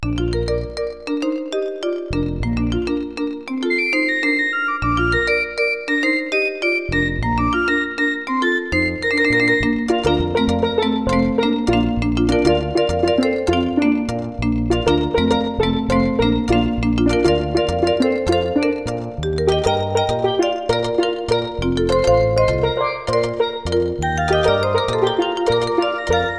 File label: peep peep peep musical ringtone